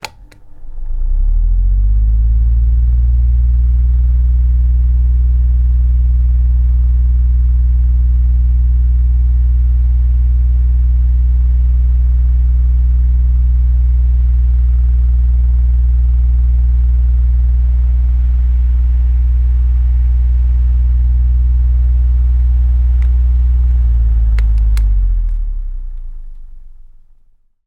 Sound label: household fan large on operate off